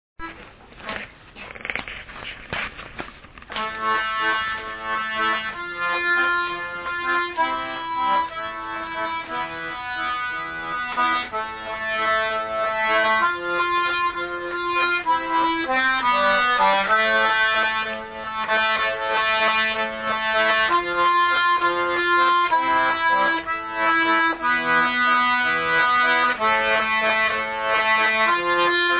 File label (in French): contre chant valse; rencontres-2013